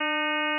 Inh f300 Flat, 300Hz, Inharmonicity

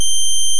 DC offset Wave G7

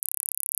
Gauss Filt C#1

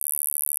Gauss Filt D2

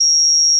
PeakSlope D#3

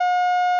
Inh f720 Not Flat, Inharmonicity, 720Hz

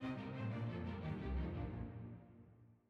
ORCH REDUC piano; Beethoven; Symph3; OutofContext

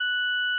Odd Increase F#6

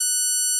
Gauss Filt F#6

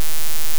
DC offset Wave D#3

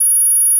PeakSlope F#6

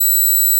Gauss Filt B7

